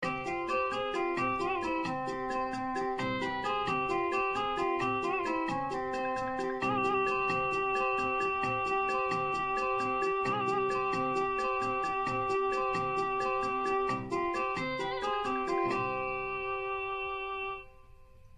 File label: natak music inter, marathi